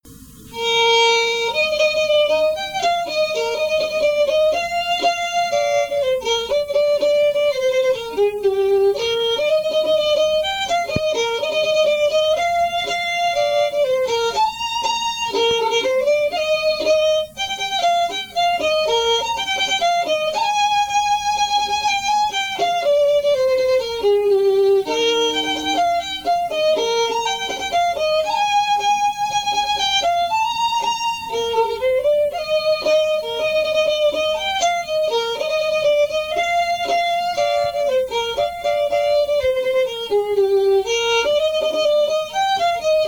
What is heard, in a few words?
fiddle; jean kirkpatricks fancy; SeamusGrantFestival